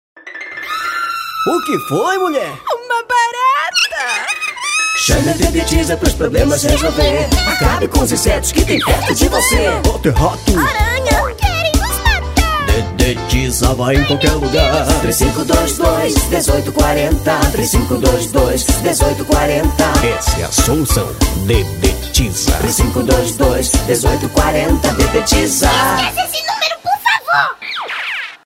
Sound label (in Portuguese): jingle dedetizza; impactsoundstudio